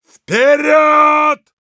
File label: battlecry, ru; «ВПЕРЁД!»; Heavy; Насмешки пулемётчика